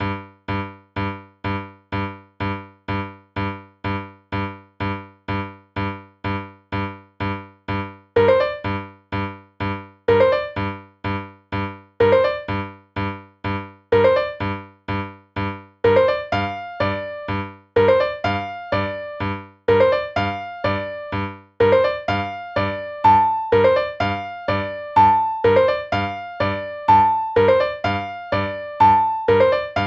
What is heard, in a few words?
piano pattern; weeklybeats-2012